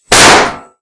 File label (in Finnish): sisa laukaus